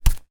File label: foley footstep single barefoot tile internal; zapsplat